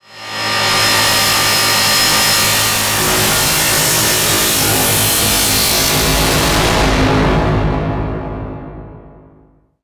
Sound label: Transition Noise Static; Production Element; Title Transition; AdobeCC